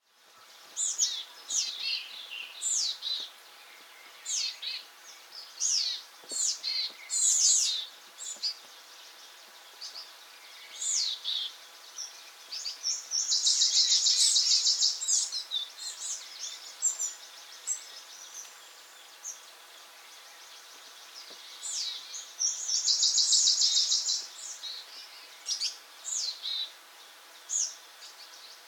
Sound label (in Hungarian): kormosfejű cinege Poecile montanus stoetzneri